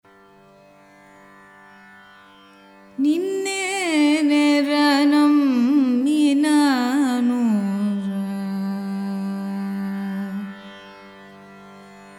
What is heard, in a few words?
song pantuvarali rupakam, carnatic music; ninne nera namminanura; thyagaraja